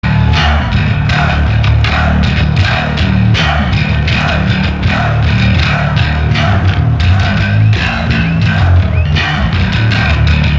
Bass Solo

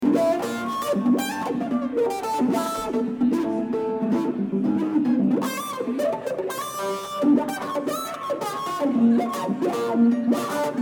Kentucky Mandolin

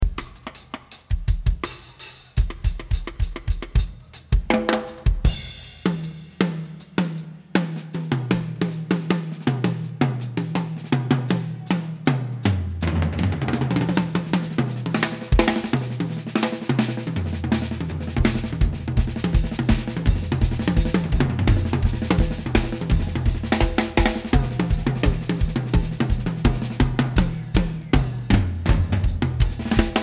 Drums

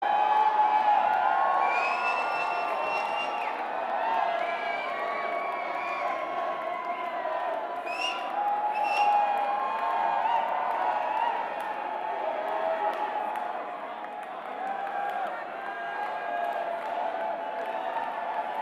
Banter Crowd

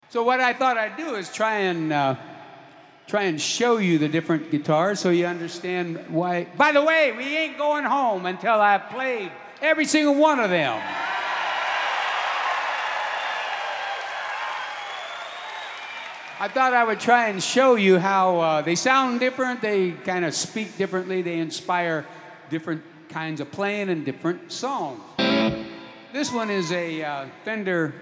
Guitar Moment

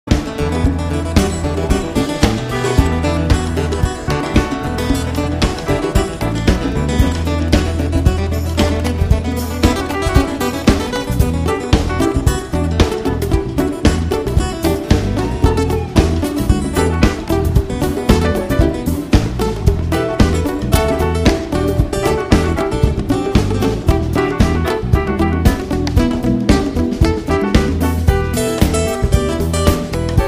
Acoustic Improvisation

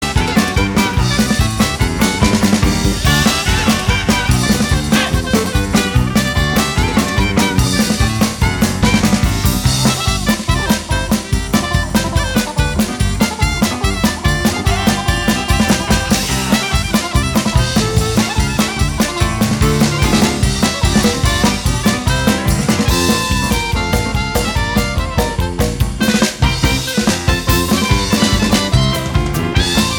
Polka

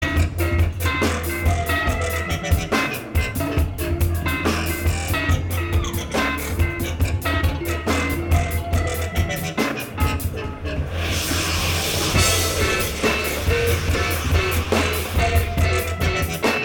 Improv Jam

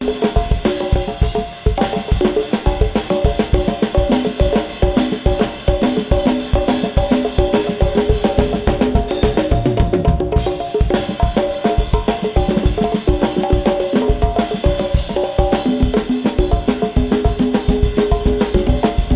Drums

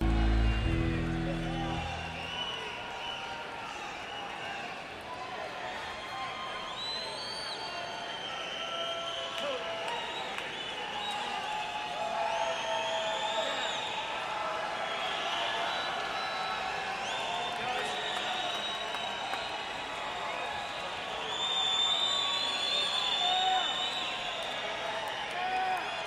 Instrumental

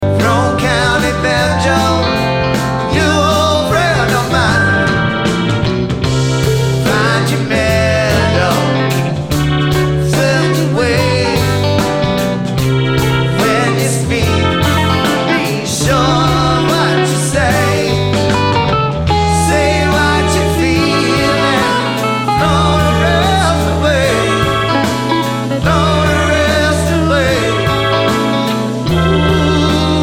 Banjo

